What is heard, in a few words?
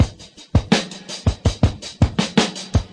82 kicking all the way, drum loops and breaks